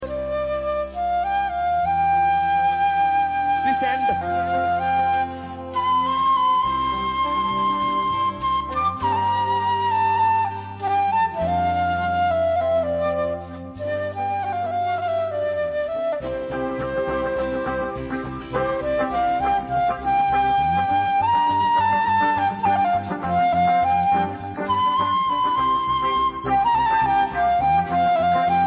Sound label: Vande Mataram Flute